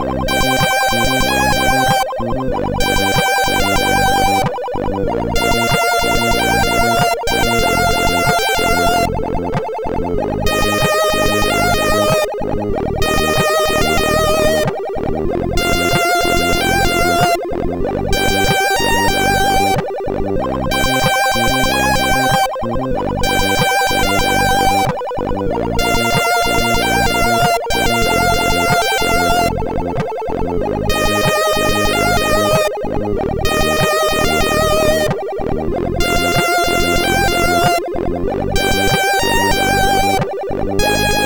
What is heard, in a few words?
sid MOS6581R4